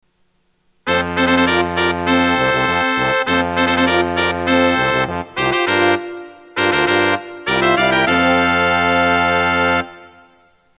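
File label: opening fanfare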